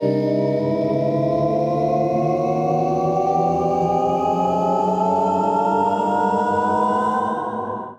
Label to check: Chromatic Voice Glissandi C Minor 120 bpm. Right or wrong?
Right